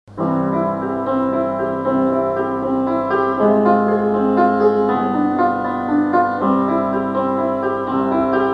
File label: Piano Extract